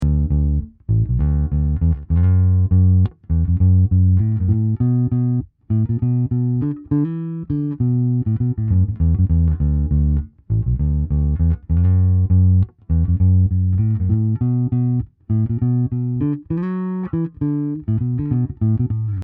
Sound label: bassman